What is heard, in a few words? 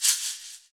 shakers